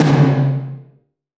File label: Tom, Gated Reverb; musicradar